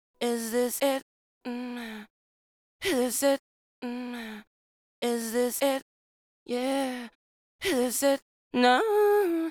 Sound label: lead vocal bridge; Multitrack